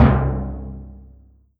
Alesis Fusion Timpani

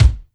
Yamaha RM50 RandB Kick

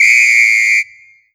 Korg TR Rack Standard Kit Long Whistle

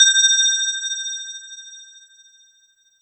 Kawai K1 II Bellery C5; Synthesizer - Bells

